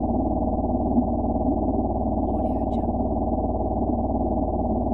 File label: small underwater scifi vehicle engine loop